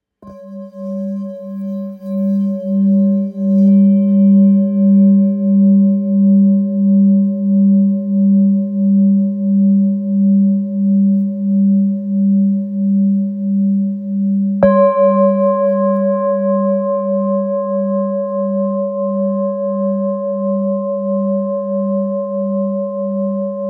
Bol ancien sol

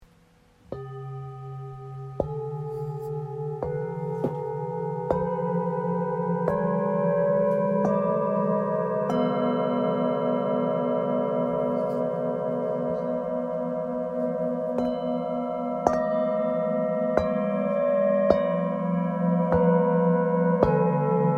Set bol bengale

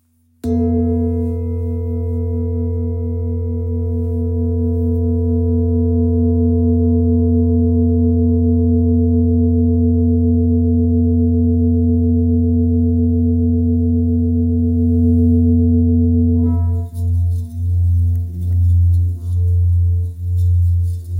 Bol ramgandi fa; Bol chantant Ramgandi à Paroi Fine

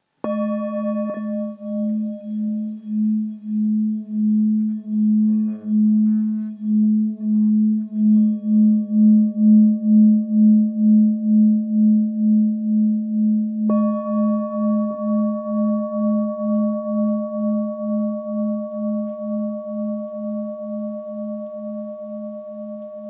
Bol grave la 1.1kg

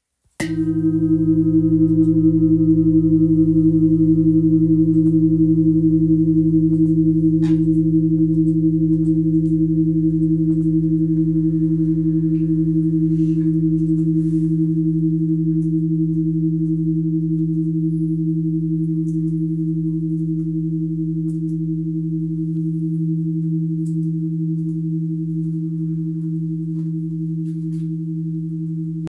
Gros bol grave la fleur arbre de vie; Bol chantant gros volume 4kg et +

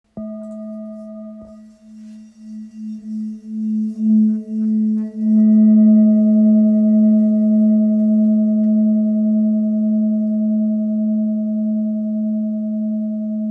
Bol ancien la

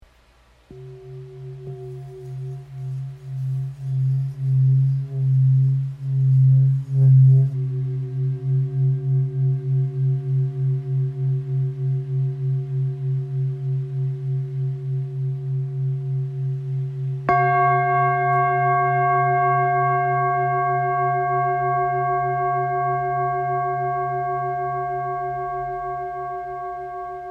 Bol therapeute do diese

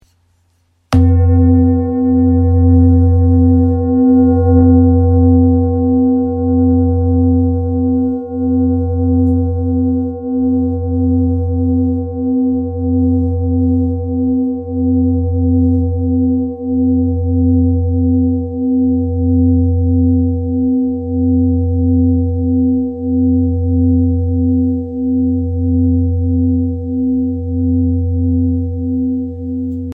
Bol chantant full moon mi

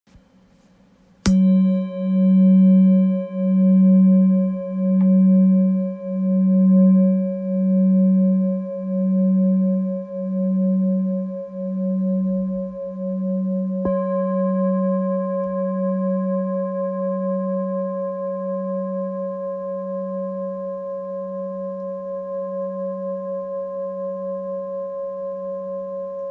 Bol therapeute fa diese, bol chantant

